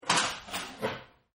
Cash Register Slammed, Cast Register Being Slammed Shut